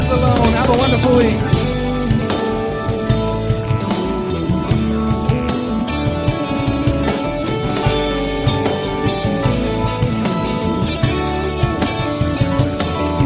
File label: solo